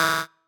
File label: marimba bike; ゆめ2っき